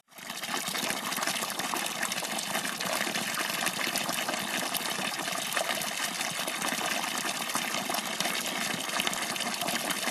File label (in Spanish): Fuente; Fuente De Los Pastores; oscardeavila